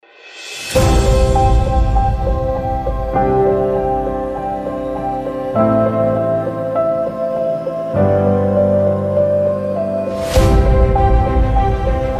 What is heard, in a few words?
saz